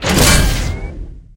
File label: Pickups; unreal development kit